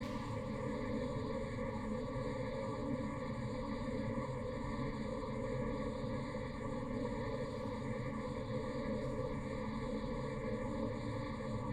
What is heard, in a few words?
Signal Noise; STI